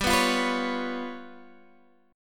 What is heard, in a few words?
G 7th Flat 5th